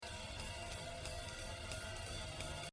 Cymba; Buddy Rich; The Evolution of Jazz Drumming (Gottlieb)

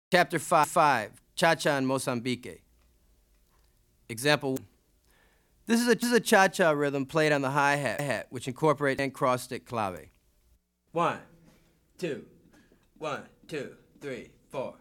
Afro-Cuban Grooves For Bass And Drums

